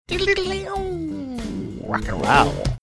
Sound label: making guitar licks sounds saying rock n roll